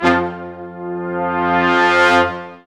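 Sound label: brass sections, large ensemble; Roland LCDP06 Brass Sections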